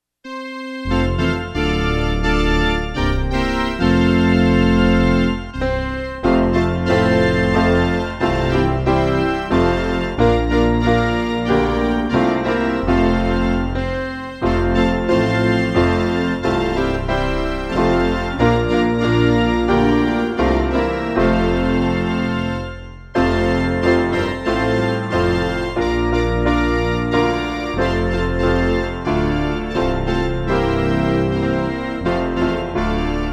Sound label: instrumental reference mix; The Solid Rock